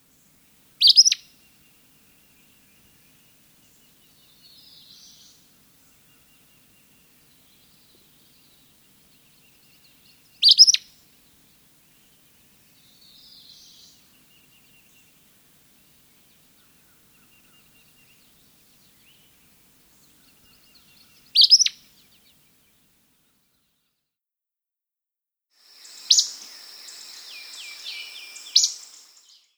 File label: Acadian Flycatcher